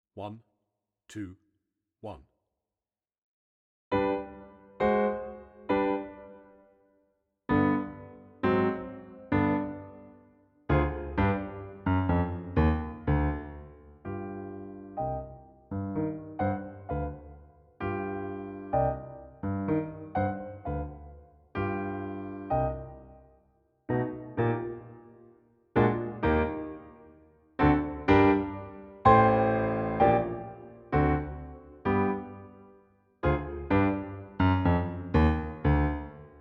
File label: accompaniment only